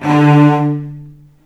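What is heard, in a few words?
D2 arco long cello, right; East West; The Ultimate String Collection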